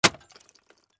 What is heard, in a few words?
Impact Automobile Taillight, Smash, Shatter